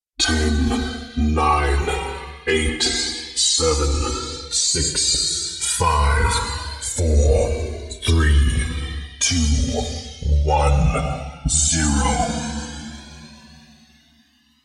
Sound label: Serious Countdown